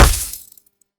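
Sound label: Bullet hit ground; Hovl Studio; SFX Battle sounds; WhiteMan Unity2D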